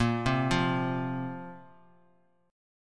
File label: Bb Suspended 2nd Bb; Bbsus2 arpeggiated; x,13,10,10; JGuitar